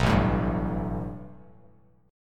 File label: A 7th Suspended 2nd Bass Standard; A7sus2 strummed